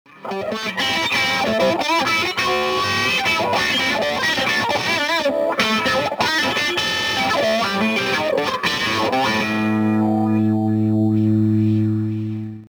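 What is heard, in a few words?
fulltone clyde wah deluxe, effector wah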